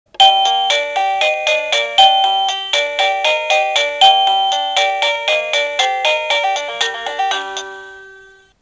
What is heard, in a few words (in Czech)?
melodie